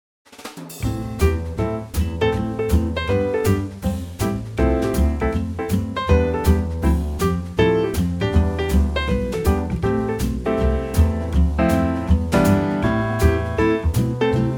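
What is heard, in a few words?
Am, backing track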